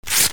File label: PAPER